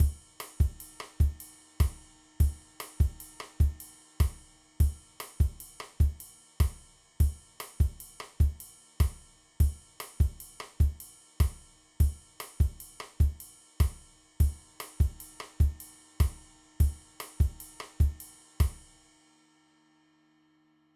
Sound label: Afro Cuban